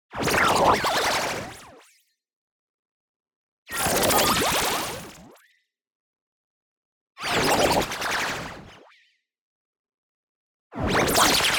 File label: MOVEMENT FAST Chaotic Wobble; boom library; Anime Essentials; DSGNMisc